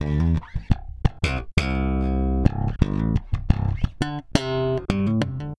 Electric Bass

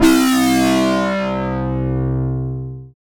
SYN, DANCE SYNTH

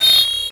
MET SW SIN, SWORDSING

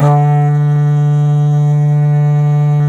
D2 HORN, Didgeridoo; Big Fish Audio; DIDGEHORN1

